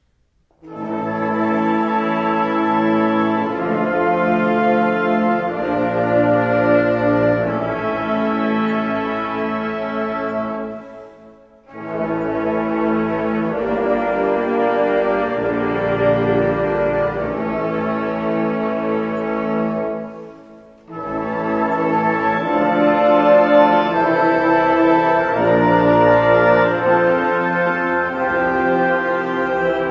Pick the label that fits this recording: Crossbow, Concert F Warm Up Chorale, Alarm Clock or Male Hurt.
Concert F Warm Up Chorale